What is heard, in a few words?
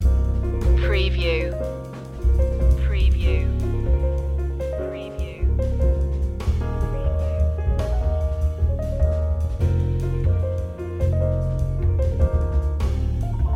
jazz fusion loop